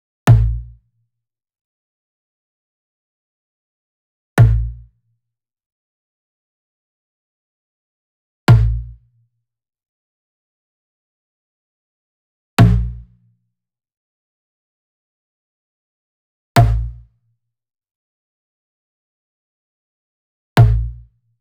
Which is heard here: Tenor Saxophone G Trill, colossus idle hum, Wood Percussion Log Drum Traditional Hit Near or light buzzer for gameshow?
Wood Percussion Log Drum Traditional Hit Near